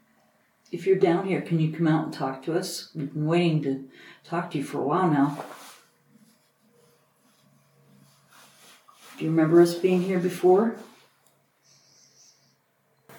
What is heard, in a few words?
Basement EVP Amplified; A similar noise, this time slightly different by a light tapping, was captured at :13.; greene county historical society museum return investigation